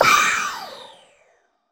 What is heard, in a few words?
crash R; Zero-G; Chemical Beats; CRASH ZOO